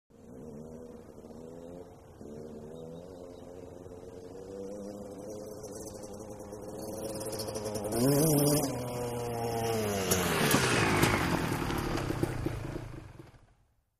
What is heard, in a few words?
Motorcycle 250, motocross